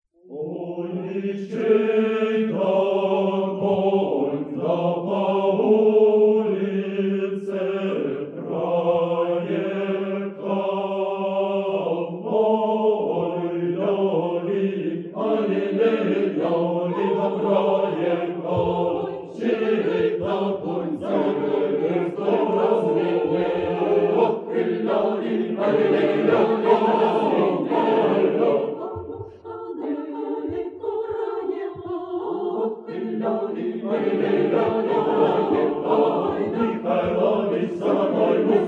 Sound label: chorus; kultur